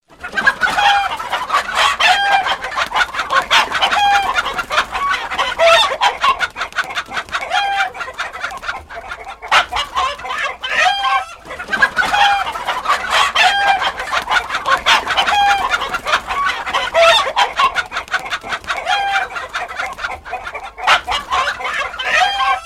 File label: Chickens Laughing